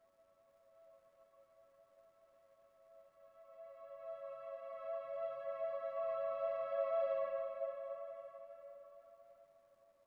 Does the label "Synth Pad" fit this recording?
Yes